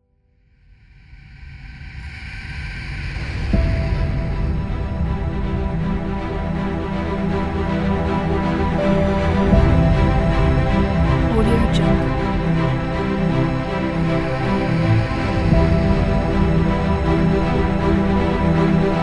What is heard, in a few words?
epic titles cinematic adventure